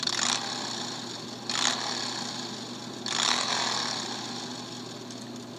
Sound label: motorcycle ringtone; apple iphone 3g 4